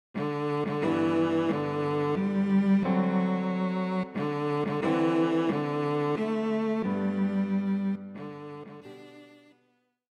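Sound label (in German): Happy Birthday Violoncello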